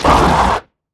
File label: attack; Barotrauma; Crawlerbroodmother